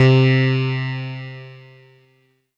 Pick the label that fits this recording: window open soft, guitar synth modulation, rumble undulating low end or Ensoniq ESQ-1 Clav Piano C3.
Ensoniq ESQ-1 Clav Piano C3